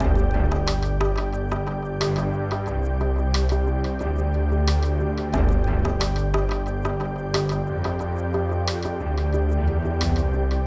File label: vibes